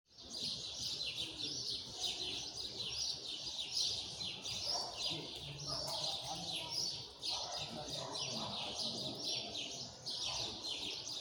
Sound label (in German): Schlangenbader Straße Vor der Verbindungsbrücke; plattenbau